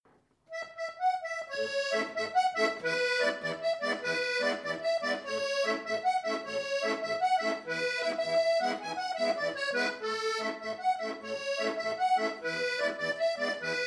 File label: Tarantella Fantasia